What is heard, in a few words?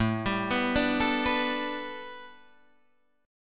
A 7th Suspended 2nd Suspended 4th Guitar Drop D; A7sus2sus4 arpeggiated